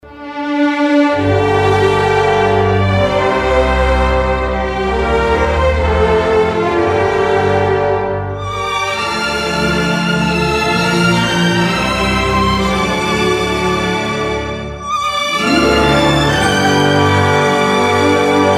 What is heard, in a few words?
Cinema Melody